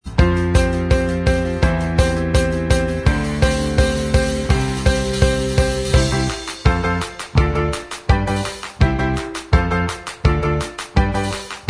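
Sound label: Instrumental Track, backing tracks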